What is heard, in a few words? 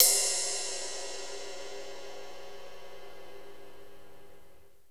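cymbal ride, Roland; Northstar; Drumscapes Roland; Cymbals 2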